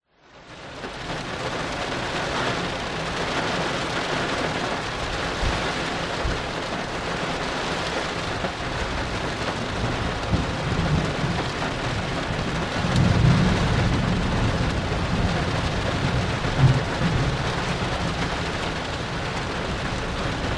nature rain med car thunder outside int perspective